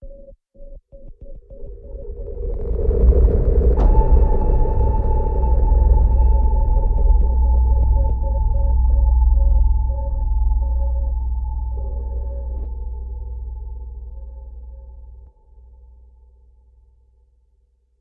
Sub Fltr Howitzer

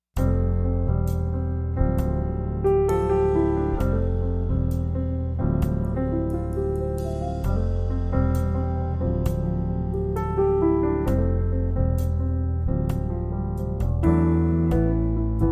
With Trio

